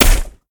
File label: Impact Large; smite; god bakasura